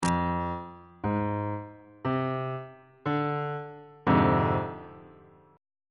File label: c double sharp half diminished 7th 1st inversion on bass clef